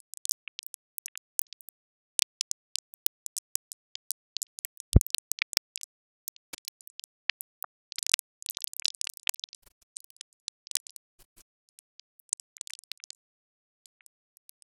Hohe klicks

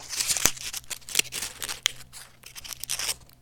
Blatt

